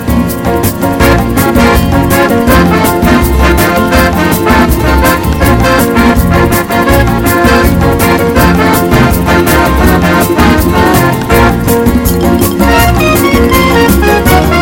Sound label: Hot Gipsy Song